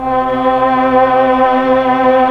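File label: pad C4, strings + vox; Keyboards of The 60's and 70's; Melo. Str+Vox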